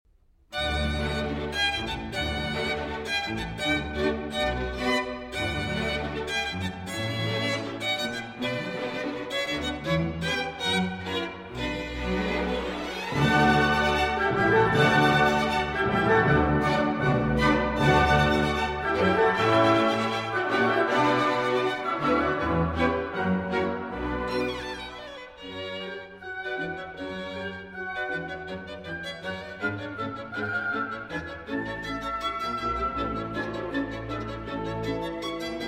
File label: Concerto in D Major for Violin and Orchestra